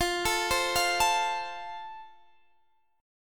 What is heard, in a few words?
E sharp Major E sharp, chord; arpeggiated